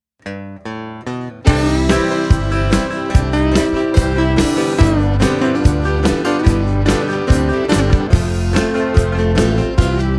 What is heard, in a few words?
Country Singer